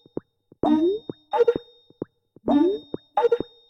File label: call outgoing loop